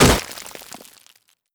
break concrete small; physics; breaking

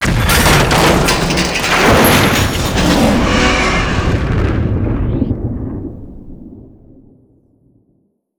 gunship crash; Half Life 2; Episode 1; outro

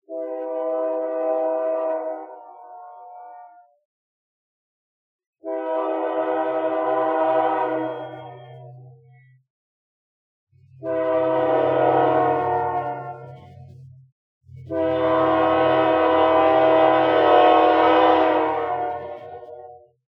train horn distant; ambient

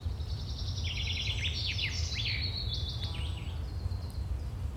birds, ambient animal; Counter Strike Model Pack; CS:GO

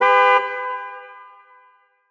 car honk distant

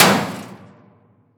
bullet metal auto; physics

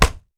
body impact fists; physics

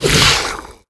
grunt soft, headcrab black; creatures; vox

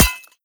pottery, bullet impacts; physics; Half Life Model Pack

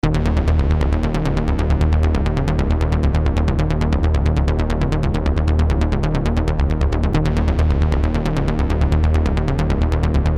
bomb planted; hotlinemiami

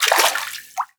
fs fm water, footsteps; Portal 2

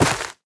step, footsteps player grass

